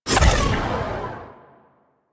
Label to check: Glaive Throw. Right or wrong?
Right